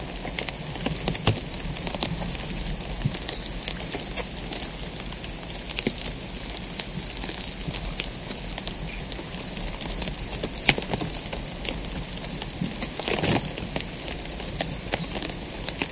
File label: ember loop; spacial loops